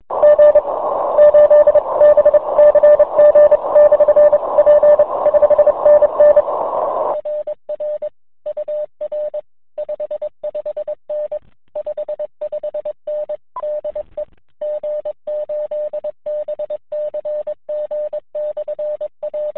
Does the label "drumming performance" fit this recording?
No